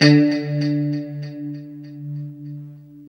LO E D 3, Guitars; Spectrasonics; Hans Zimmer Guitars VOL-1; JC-SHEEN

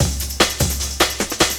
LOOP, Drum & Bass, 150 BPM

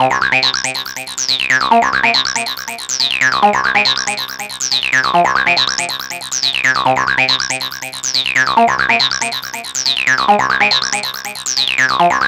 NRG Arp, trance

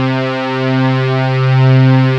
open saw, Yamaha W-7; Rubber Chicken Software Sample Bank

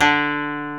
KOTO D2; World Combo

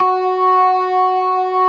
ORGAN COMBOS; Keith Emerson Signature Series

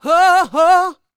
D BIT, Vocal R&B Dance

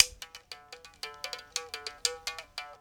mouth bow, Africa

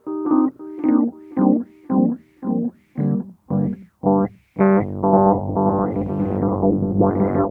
RHODES FX; PlatinumPhattCD2; E-MU Producer Series Vol. 8 – Platinum Phatt

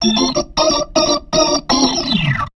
B3 LICK C-D#

